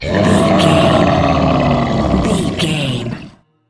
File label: troll monster growl